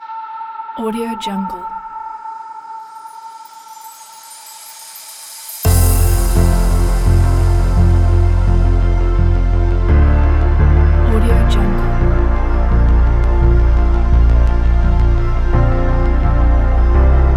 soundscape uplifting

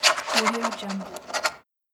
writing signature; yellowcatt

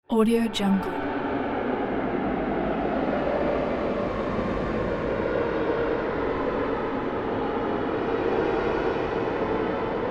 horror drone of darker times

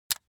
object household razor rickety fold out

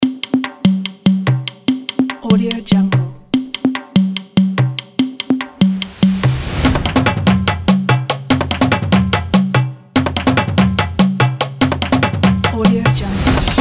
tribal percussion

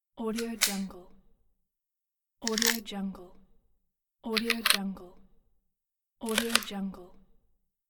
aperture ring

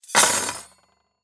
silverware set down

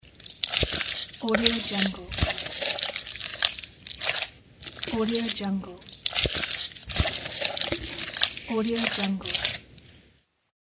mixing salad

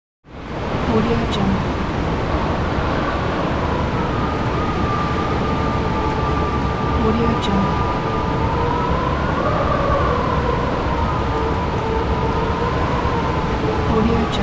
heavy wind; wistansound

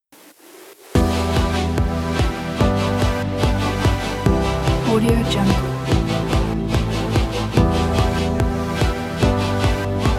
future ambient electro kit

